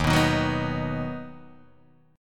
D sharp Minor Sharp 5th D sharp down Guitar Half Step Down